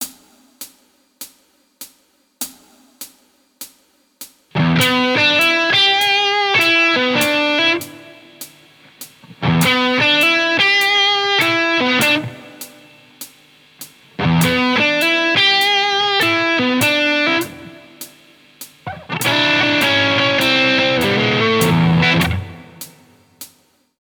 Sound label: Lickbag, Guitar